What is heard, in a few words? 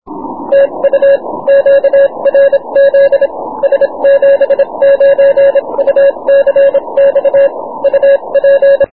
20m cw